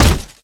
step run

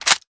bolt forward, weapons vss

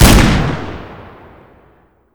357 single

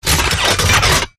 gunstand pickup, turret; gow; turret judgement

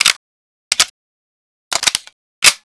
mp40 world reload; weapons

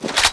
off shoulder, dual uzi; tfa cso

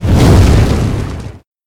fireball explode

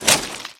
fidget move

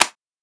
flare gun hammer up; tools

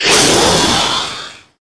spider monster striking

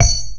concrete

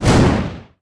kick

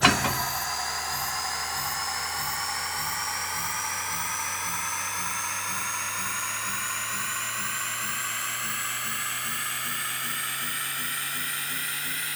nos start, car

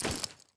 weapon draw; ins2; weapons

